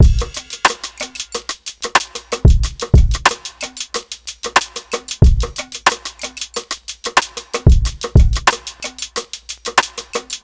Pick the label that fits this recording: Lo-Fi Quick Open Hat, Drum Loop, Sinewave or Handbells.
Drum Loop